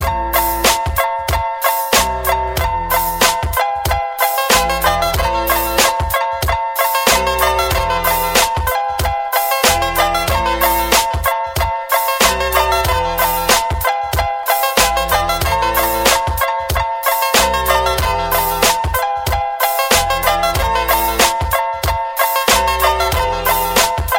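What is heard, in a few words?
instrumental, ringtone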